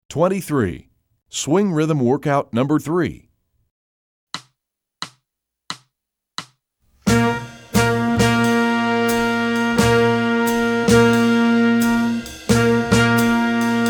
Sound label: swing rhyhms workout